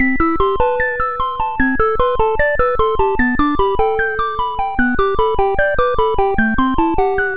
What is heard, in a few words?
Menu Music, sid MOS6581R2; Morton Adam; Zelda